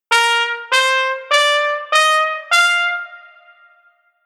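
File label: Horn Accent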